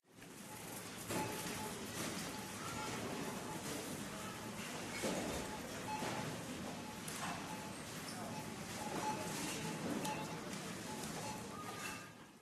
rsound supermarkt; minecraft

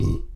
reaktion ruelps; minecraft

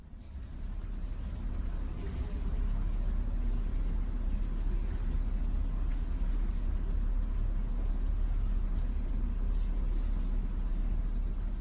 faehre; minecraft

